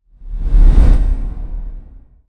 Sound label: SWOOSH [BASS] Slow; SFX Royal